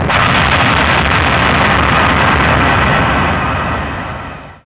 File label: CYBERGUN L, Hard & Loud Techno; USB Soundscan vol.01; AKAI